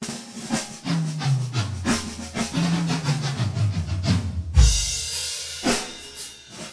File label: Drum Solo; TSMtoolbox